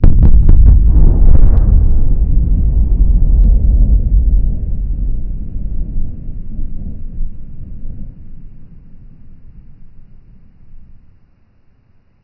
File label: mortar exterior